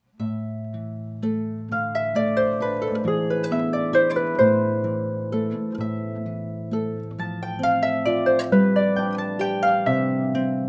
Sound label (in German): schwedischer landler